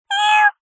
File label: animals cat kitten meow; zapsplat